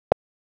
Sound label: pulse